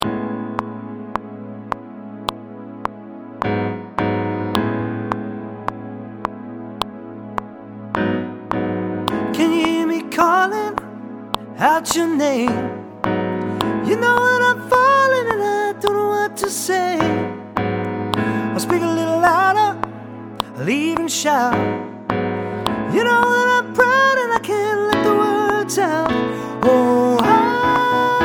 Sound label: Everywhere Sop